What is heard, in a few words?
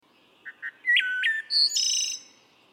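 phrase, wood thrush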